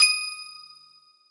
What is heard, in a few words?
bell D#7